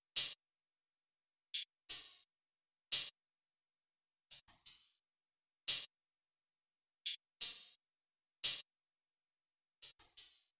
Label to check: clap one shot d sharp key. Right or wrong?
Wrong